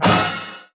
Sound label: splatted